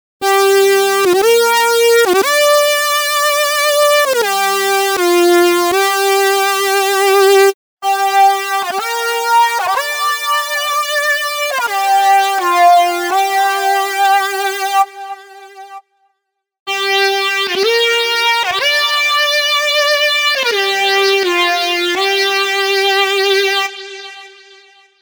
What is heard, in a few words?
Lead; RingX